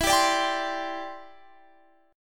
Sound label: E Minor 7th Sharp 5th Guitar Drop D